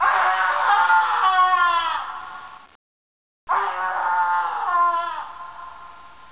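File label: Scream, male; Halloween; MSCREAM2; Adoption MacroWav Library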